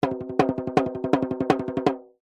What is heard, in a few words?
drum bongo fast tribal riff